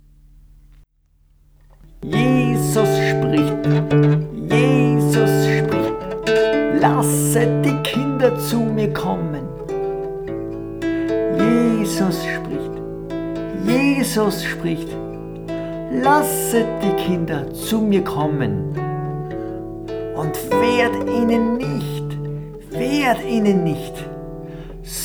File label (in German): Christliche Volksmusik Lied